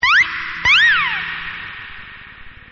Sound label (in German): pfeif